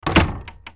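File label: wooden door closing sound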